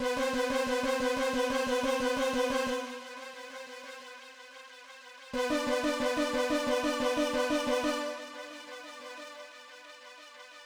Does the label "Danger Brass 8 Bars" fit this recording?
Yes